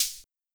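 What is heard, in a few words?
Hi-Hat Open, Luxor Passet; Melosonic; Drums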